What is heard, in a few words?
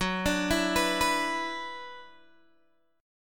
F sharp 7th Suspended 4th Sharp 5th, Guitar Open G